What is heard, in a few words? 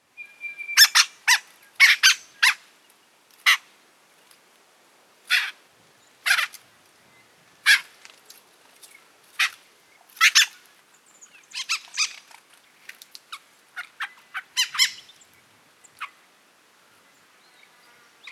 Hall's babbler